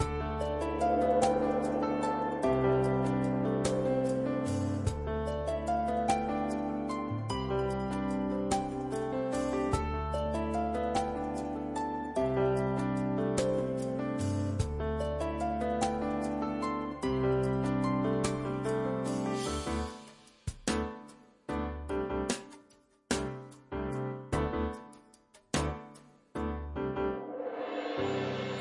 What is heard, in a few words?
tower stairs up; bgm